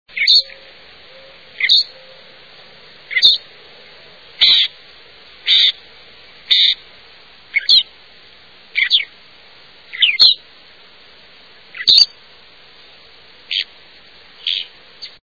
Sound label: Loggerhead Shrike